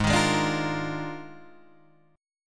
D sharp Major 7th Suspended 2nd Suspended 4th, Guitar; Gsharp; 4,6,6,7,6,6; chordshape